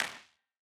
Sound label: Wider Clap